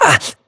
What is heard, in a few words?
grunt hits; Titanfall 2